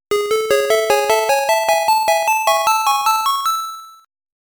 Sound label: Reward; Toaplan Arcade Sound Digital Collection Vol.09